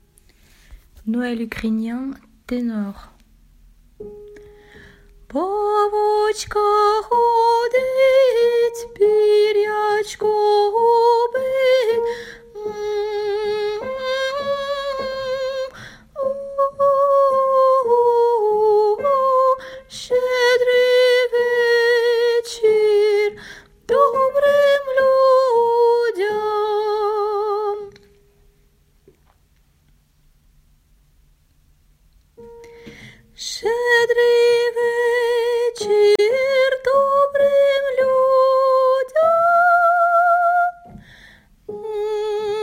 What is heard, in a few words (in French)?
noel ukr pavochka tenors; noel ukrainien pavochka